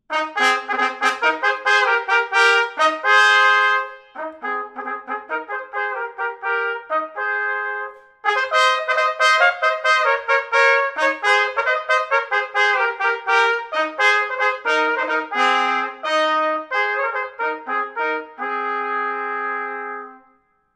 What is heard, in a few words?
German Hunting song, trumpets